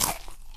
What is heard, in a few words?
eating chips